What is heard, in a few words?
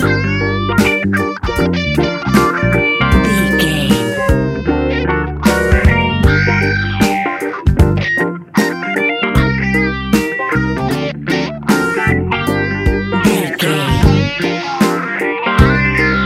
hip hop time capsule, A classic piece of Hip Hop and Rap music